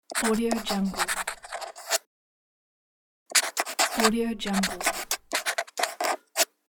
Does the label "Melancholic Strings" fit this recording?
No